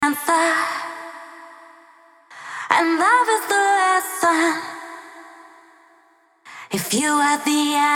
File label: test chorus acapella wet, futurepop